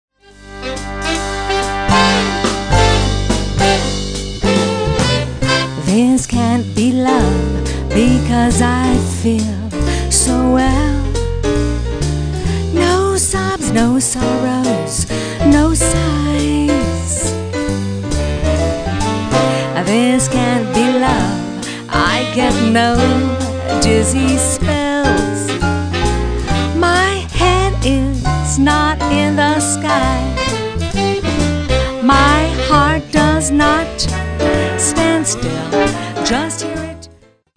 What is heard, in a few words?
vocal, big band